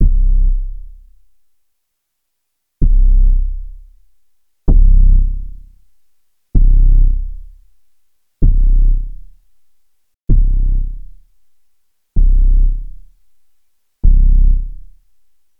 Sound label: Bass High Quantity